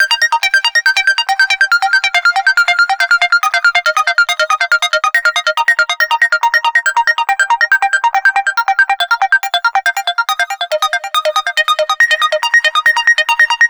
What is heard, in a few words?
NRG Arp, trance